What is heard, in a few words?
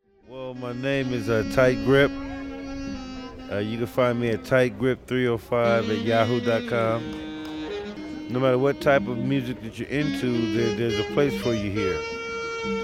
Festival jazz music